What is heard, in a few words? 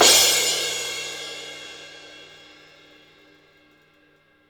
cymbal